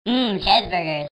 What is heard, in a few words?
hmm